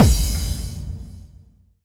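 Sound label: bass drum FX, dance drums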